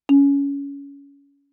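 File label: Marimba yarn fortissimo Db4; MIS Pitches - 2014; Percussion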